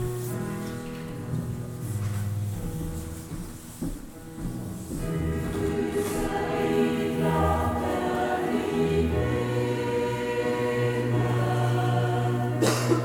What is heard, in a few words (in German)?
Süßer die Glocken nie klingen; Sternsingermesse